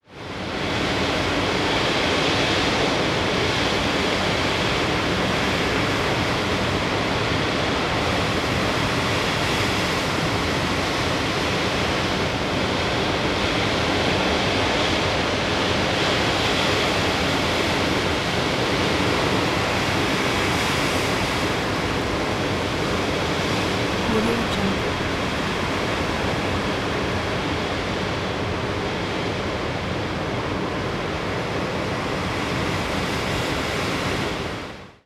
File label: heavy wind ambience; stormwaveaudio